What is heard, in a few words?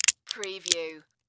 Walnut Crack Open; Sound Effects Bible